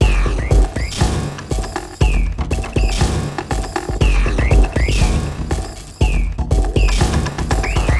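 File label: Loop, beats; Sonic Foundry (Sony Creative Software); Esoterik Beatz; Mecan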